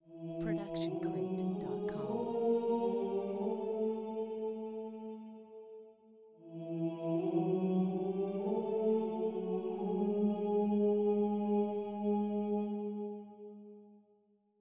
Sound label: monk praise; soundscrate